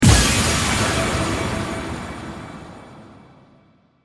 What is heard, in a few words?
SE atk light